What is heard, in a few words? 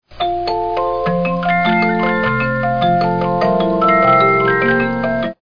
music boxes, 50-Note Movements; Blue Danube Waltz